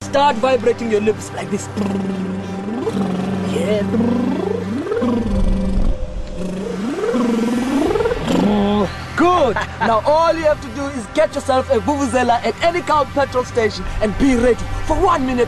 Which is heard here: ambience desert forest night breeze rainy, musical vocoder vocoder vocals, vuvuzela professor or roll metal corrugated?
vuvuzela professor